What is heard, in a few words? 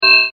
multimedia rollover, Multimedia Rollover Tone; McKinney Sound